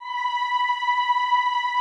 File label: EEH B4, Classical Choir; Best Service; Extended Classical Choir